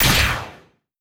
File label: spin Button; netent